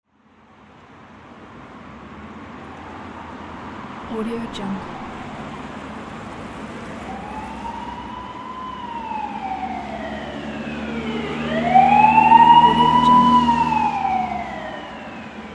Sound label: police car siren